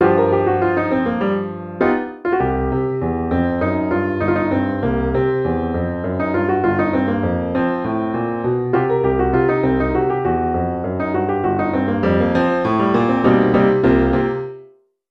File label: BLUE BOOGIE intermediate piano solo